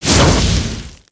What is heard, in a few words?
Attack Fireball; Dragon In A Box; destructibles